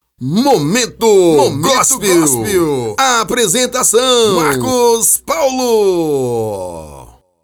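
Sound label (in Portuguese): momento gospel alegre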